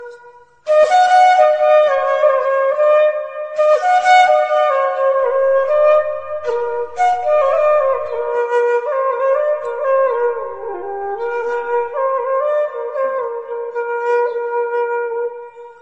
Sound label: flute nepal; ringtone